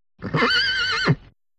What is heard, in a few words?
Single Horse Whinny